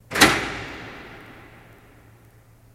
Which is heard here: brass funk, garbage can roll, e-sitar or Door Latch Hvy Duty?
Door Latch Hvy Duty